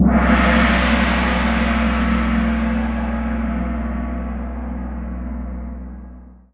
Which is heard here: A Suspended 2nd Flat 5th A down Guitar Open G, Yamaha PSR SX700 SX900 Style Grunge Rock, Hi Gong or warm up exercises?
Hi Gong